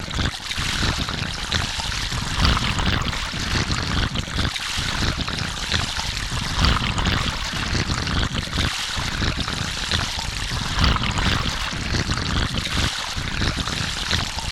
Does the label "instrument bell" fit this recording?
No